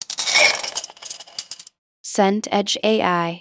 pour cereal bowl

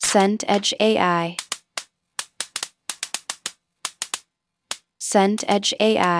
fire crackle

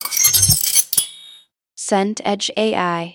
knife sharpen steel

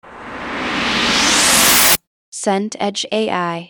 riser noise long

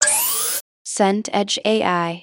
ui charging start

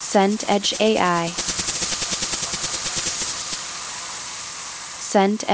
blizzard wind snow

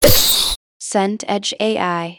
sneeze

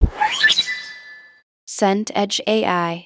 message send

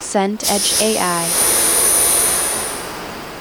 amb museum gallery

